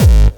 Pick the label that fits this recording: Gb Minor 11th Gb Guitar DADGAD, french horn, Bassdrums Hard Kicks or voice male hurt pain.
Bassdrums Hard Kicks